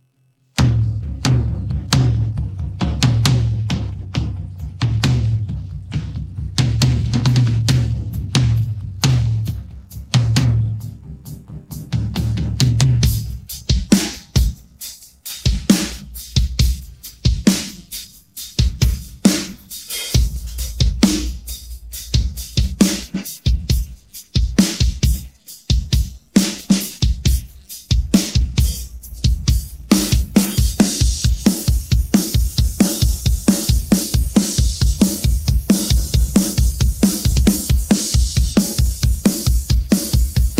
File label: drums